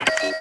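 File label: Button; Star Wars Obi Wan; XBOX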